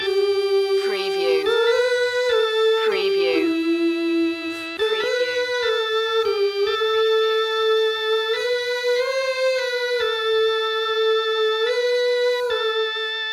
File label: driving in my woody loop